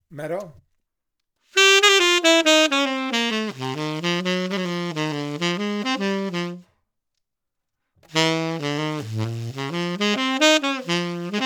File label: Metal; DL